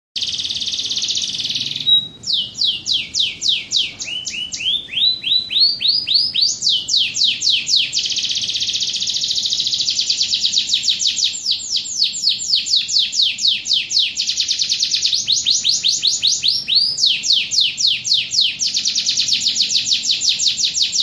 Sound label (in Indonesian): audio kenari standar kapal oleng original jeda 1 menit